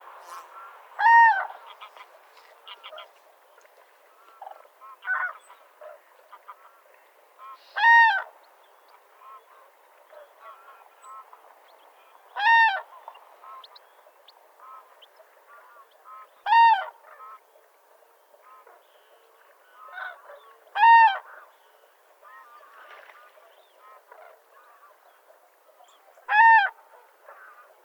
call, Grus grus, Crane, on ground, There is a foreground bird which calls many times. Background: Greylag geese, Mallards and Cranes